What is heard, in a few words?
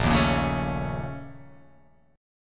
A sharp Minor Major 7th Double Flat 5th A sharp